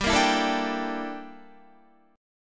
G Minor 6th Add 9th G down Guitar; Gm6add9; strummed

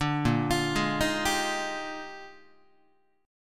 A sharp 13th, Guitar chord; arpeggiated; JGuitar

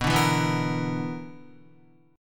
B-Minor Major 7th Double Flat 5th B down Guitar; BmM7bb5 strummed

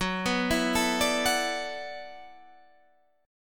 E 7th Suspended 2nd Suspended 4th, Gb, chord

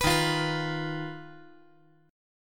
Eb Suspended 2nd Sharp 5th; Ebsus2#5 strummed; JGuitar

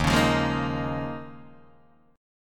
D sharp 9th Suspended 4th D sharp, Guitar DADGAD

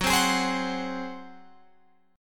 F sharp Suspended 2nd Flat 5th, Guitar, Open F; F#sus2b5 strummed; 18,19,20,19,21,19; chord shape

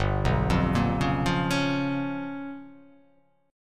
G 7th Sharp 9th Flat 5th

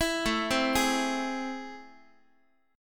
A Minor Major 7th, down, Guitar; arpeggiated; AmM7; JGuitar

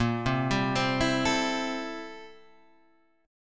A Minor 7th Bb, Am7/Bb chord; Am7/Bb arpeggiated; JGuitar

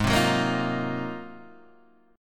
Ab 13th Guitar DADGAD, Ab13 chord; strummed; JGuitar

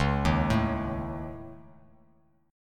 C sharp Suspended 2nd Sharp 5th C sharp; C#sus2#5; arpeggiated; JGuitar

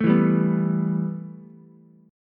B 7th Suspended 4th Sharp 5th, Ukulele; B7sus4#5; strummed; JGuitar

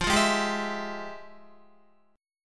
F Minor Major 9th, Guitar, chord; FmM9; strummed

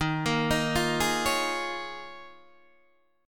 Eb 9th Suspended 4th Eb, Guitar Open D

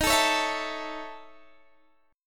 A Major D sharp; strummed; JGuitar

